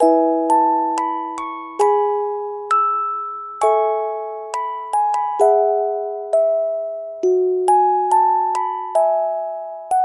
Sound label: keys music box sleepy time, Loops, Jazzmatazz